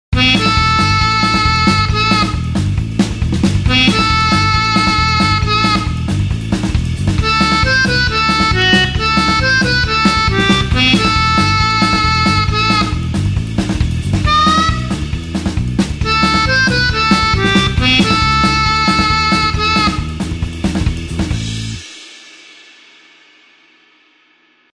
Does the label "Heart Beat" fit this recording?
No